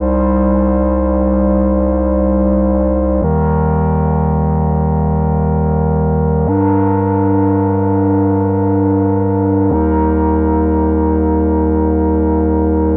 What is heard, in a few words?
Loop, Analog Reels, Synth Chords, Heavy, Cm, 74